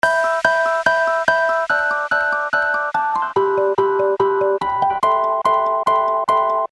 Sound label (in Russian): priyatnaya melodiya